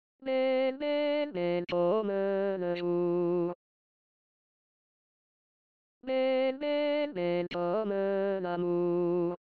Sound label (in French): hommes